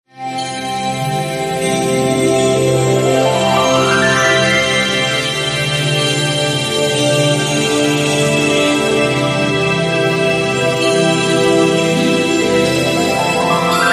Samsung Galaxy S3, Ringtone

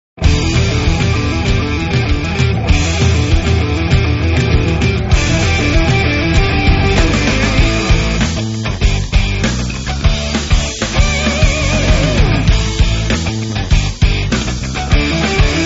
Roadie, ringtone; Nokia Asha

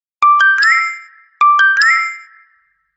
Nokia Princess, Ringtone

